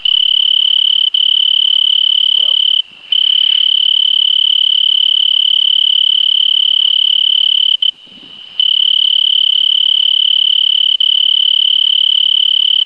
Oecanthus pictus, 19degree Celsius

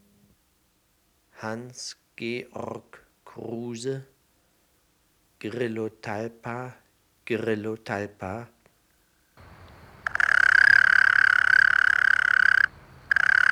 Gryllotalpa gryllotalpa

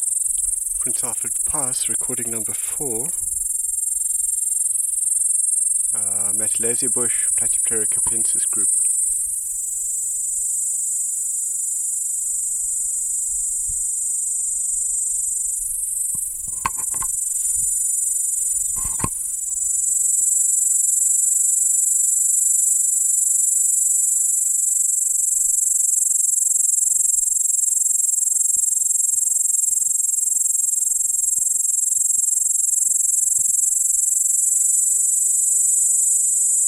P.capensis Prince Alberts Pass

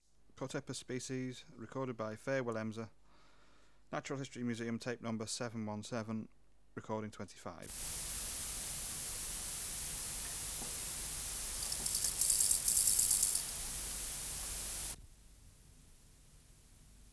Chorthippus

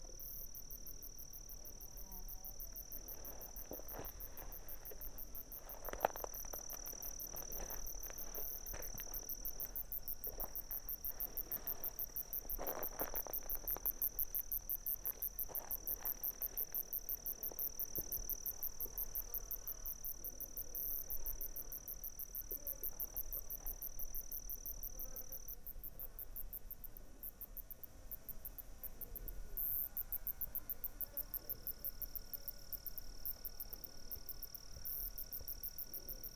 goldsilver cicada; WA

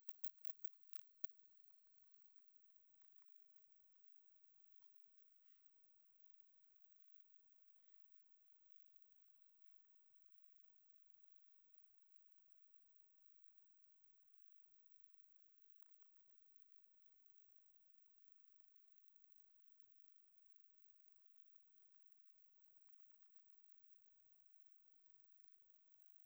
Rhinolophus affinis 20kHz HP

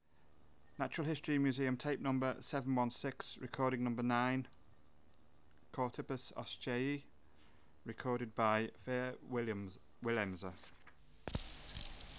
Chorthippus oschei

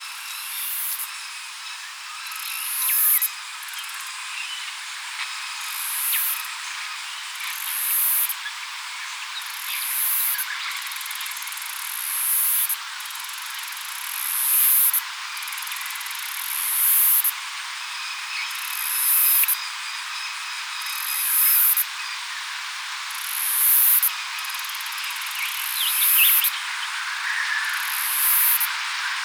Myopsalta umbra Mt French

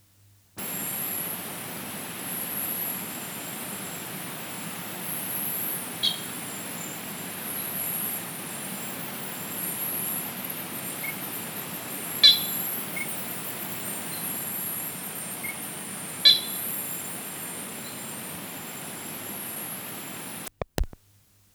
Megophys m. nasuta; Mulu

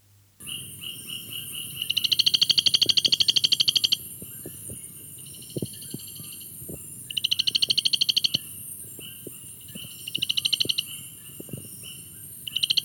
Rana baramica; Mulu BAse

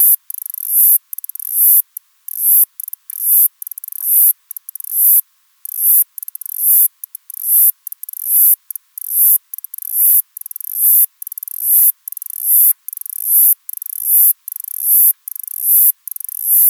Myopsalta septa; Leslie Daml

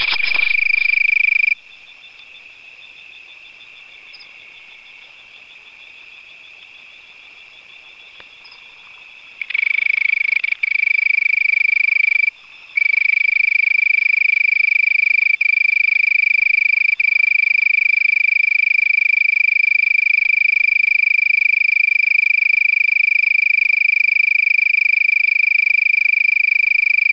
Oecanthus pictus, 16degree Celsius

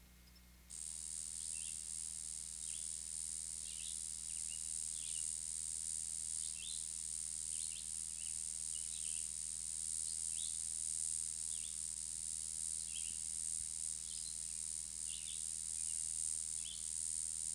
Okanagana rimosa

